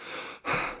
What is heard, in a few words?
sprint breathe, police